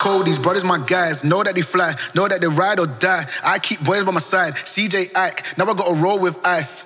vocal male after

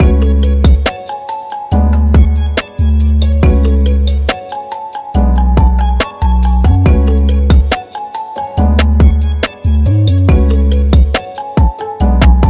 kalimba

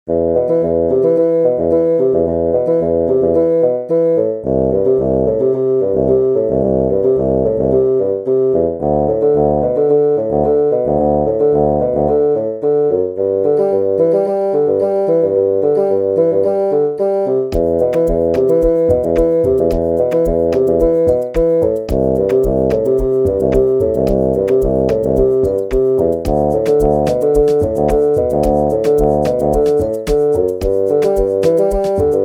bassoon; scallywag

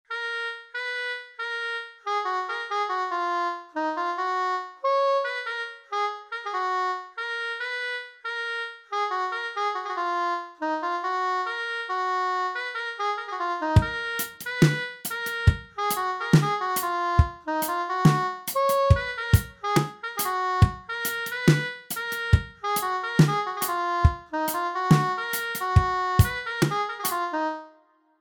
brass soprano

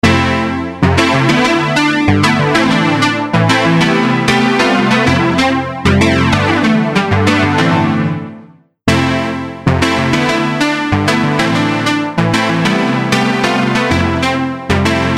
instant flanger mk ii

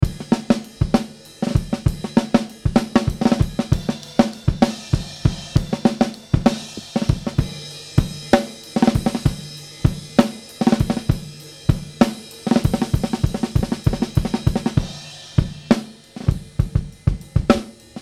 tape breaks

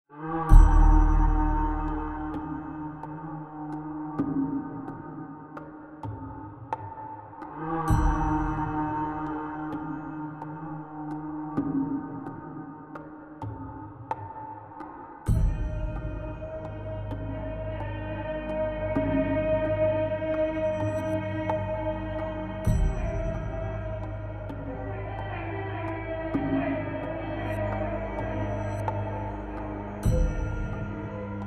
kangling

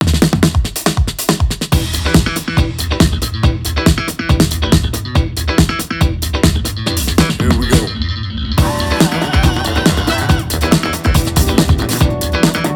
virtual bassist slap

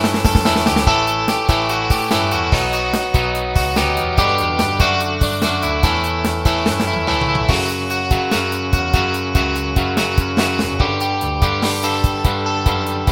electric guitar subtle over

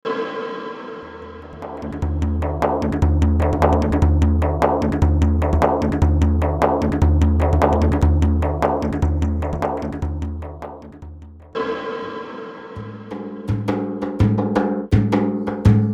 anatolian perc raw

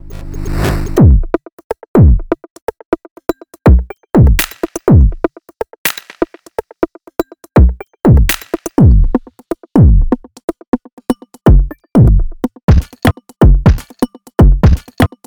modular drums

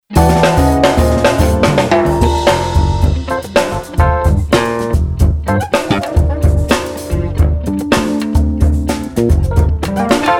partido alto full kit

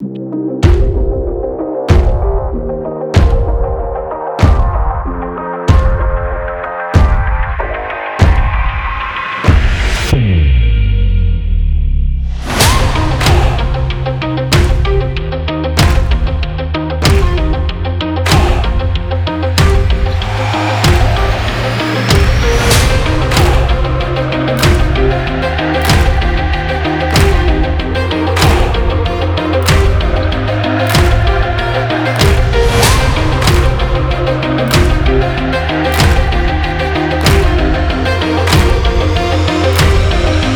anthem

